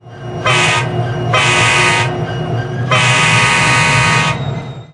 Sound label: K5LA; Nathan; lirrhorns